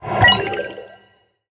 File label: Powerup; Treasure of Nadia